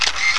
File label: Camera - One Click; Olduvai Fonts & Sounds